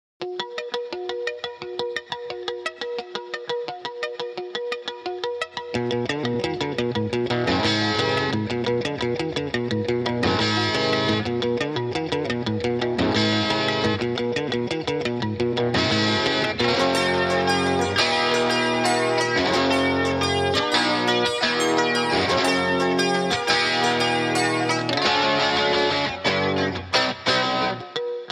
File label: Guitars Only